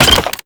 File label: pickup data grab